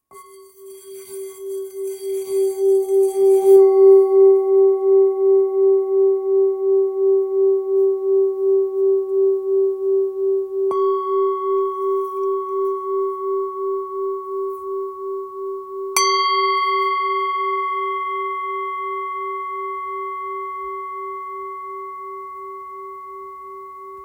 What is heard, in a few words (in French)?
Bol ancien sol